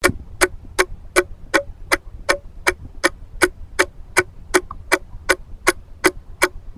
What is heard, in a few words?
clock; SuperTuxKart